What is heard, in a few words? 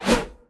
blade 1 hand swing